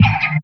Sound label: 808 Bass, Fx; Sonic Foundry (Sony Creative Software); Esoterik Beatz